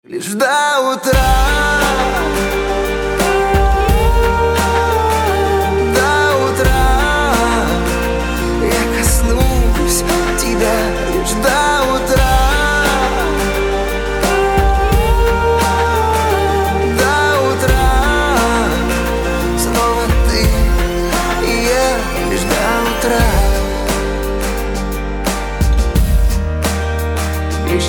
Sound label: Ringtone